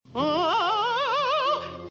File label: oooowowooo